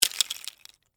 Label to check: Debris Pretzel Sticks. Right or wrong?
Right